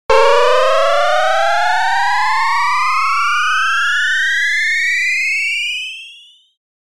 meter up; 攻撃

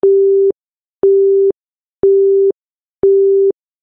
call end

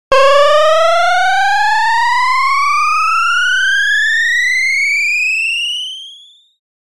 meter up; 攻撃

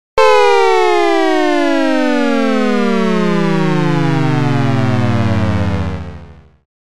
meter down; 攻撃

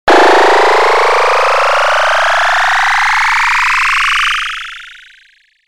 energy charge; 攻撃

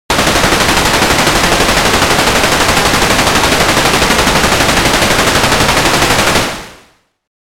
machinegun; 攻撃

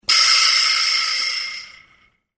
vibraslap

